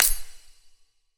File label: clap; smite; daji